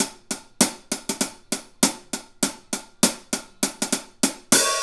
Hi Hat Pattern

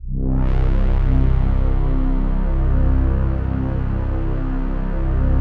PAD, DIGI-PADS

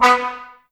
brass, Tbs Mute; Miroslav Vitous - Woodwind Brass Ensemble

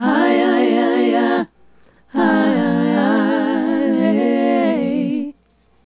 Female Group, Hip-Hop and RnB Vocals

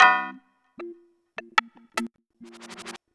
Clap And Slirp, Beats; Sonic Foundry (Sony Creative Software); Synchro Funk

